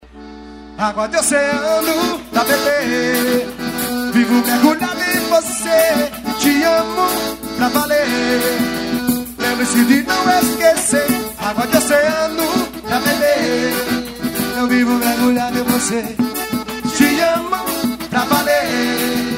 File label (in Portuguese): forró